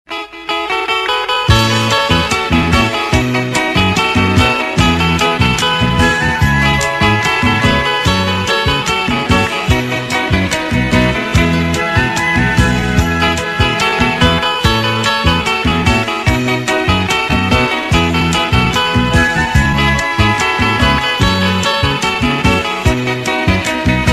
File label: Karaoke Minus One